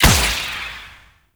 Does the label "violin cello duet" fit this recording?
No